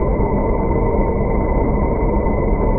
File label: warp engine; Earth and Beyond; EA Games